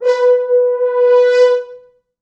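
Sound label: French horns, four, b3; East West; Quantum Leap Horns Sax; 4 FrHorns fts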